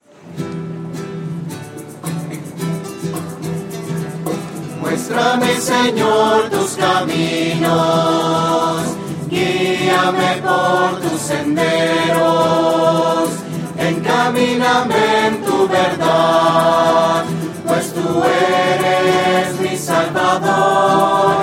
voces; muestrame sennor tus caminos; misas 2012

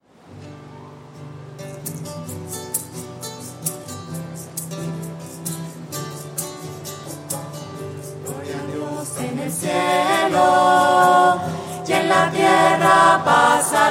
gloria voces; misas 2012

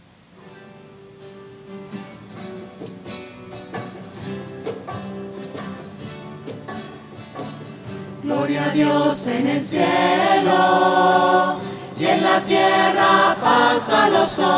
gloria voces; misa

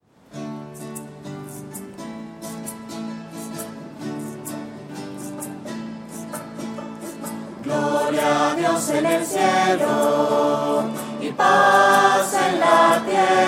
voces; misas 2012

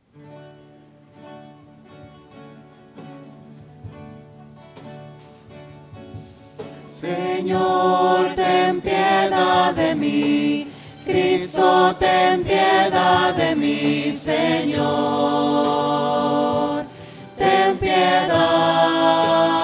voces; sennor ten piedad gloria combo; misa

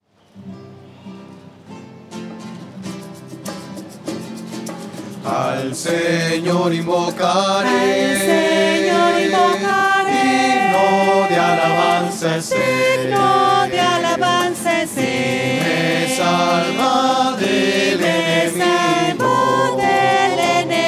voces; al sennor invocar; misa

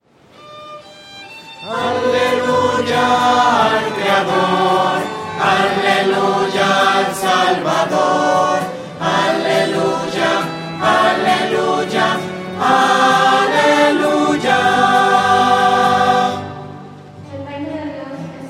aleluya al creador voces

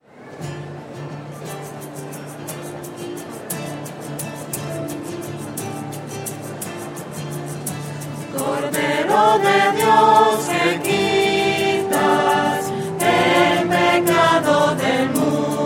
cordero de dios voces; misas 2012

